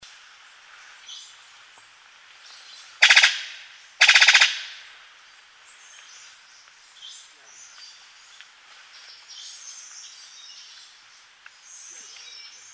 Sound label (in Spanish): canto del ave del paraiso epimachus meyeri